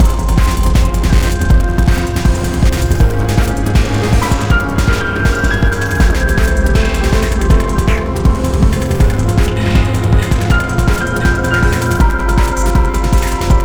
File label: Waterworks Murky Fast; rive wreck hack die retry; ps4